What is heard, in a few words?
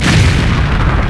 mech explode; firestorm; Gameleap; mw4